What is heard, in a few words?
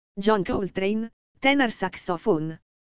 Tenor Saxophone